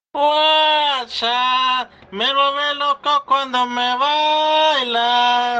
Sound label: wachaaa